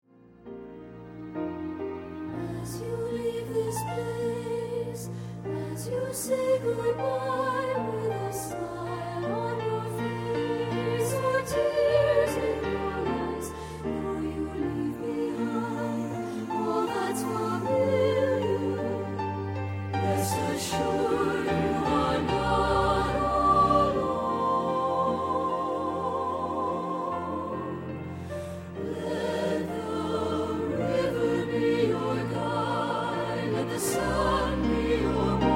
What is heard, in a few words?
SATB